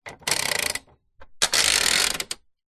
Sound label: office slide projector vintage slide rack push back clicks; 1960 voigtlander perkeo; zapsplat